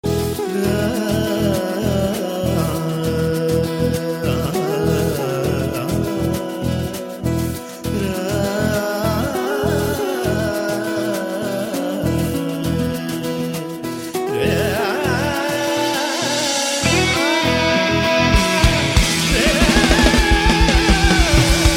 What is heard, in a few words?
Classical